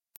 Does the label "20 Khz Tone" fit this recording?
Yes